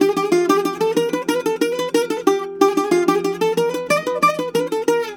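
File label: G, bouzouki; USB Soundscan vol.53 - Mediteranean Traditions; AKAI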